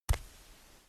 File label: sound Marsh Concrete Jump; freedom fall